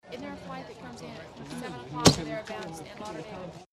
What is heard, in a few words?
Stamp Ticket Passpor, airport